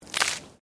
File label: Water Splash Concrete Small